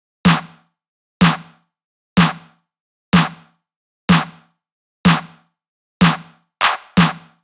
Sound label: Alternate Drum Loop 125 BPM Snare, Analog; Drum Loops; Cymatics - ANALOG EVOLUTION Beta